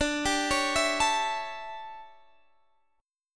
D Major 7th Suspended 2nd Suspended 4th Guitar Standard, chord; arpeggiated